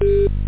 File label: BUSY; 8,000 sample rate